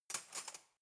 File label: cutlery knife drawer place soft